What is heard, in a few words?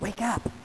wakeup